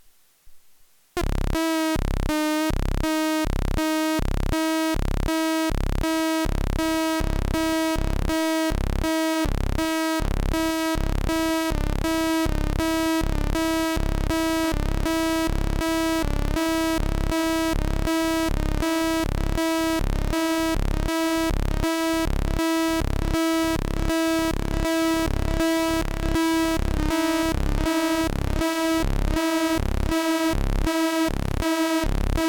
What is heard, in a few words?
Korg Monotron Delay Standalone Jam